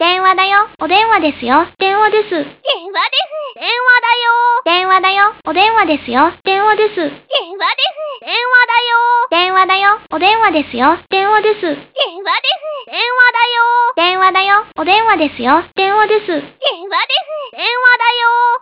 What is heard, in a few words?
ringtone